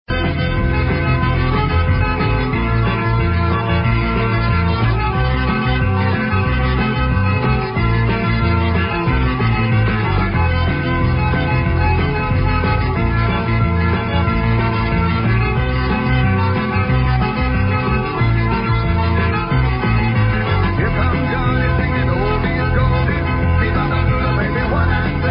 cajun

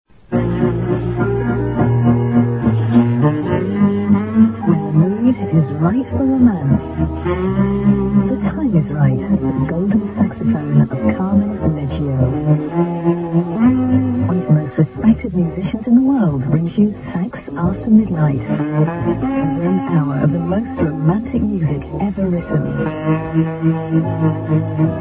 sax

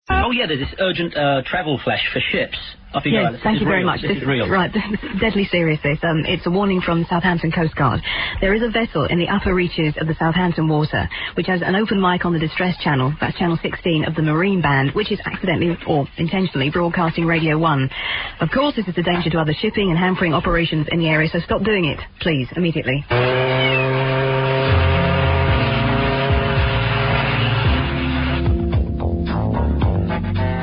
ship

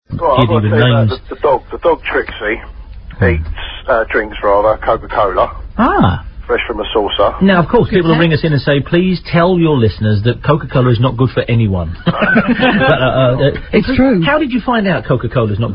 coke burp

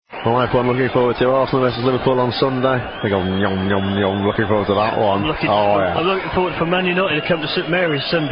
nyum nyum

